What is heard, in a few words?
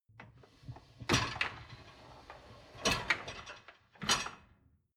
dishwasher lower tray draw out long; maschinen; spuelmaschine